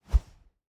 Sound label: Fighting Woosh; SoundsCrate